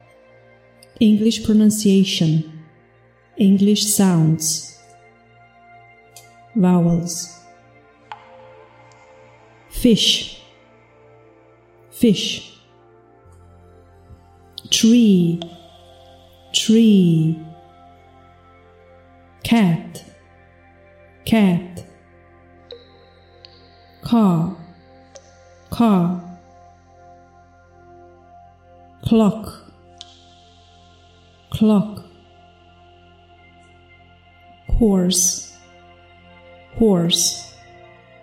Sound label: White Sounds Vowels; MindCruise